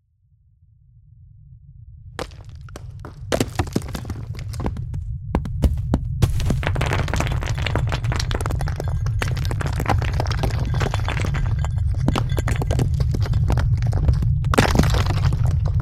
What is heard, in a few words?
Earthquake Landslide Rocks